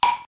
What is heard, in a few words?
wood